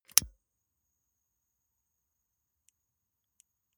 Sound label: lighter electric switch flame on gas